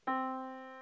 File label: Yamaha Disk, piano sound